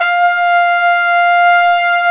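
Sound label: WND, Hybrid Winds, Flugel & Soprano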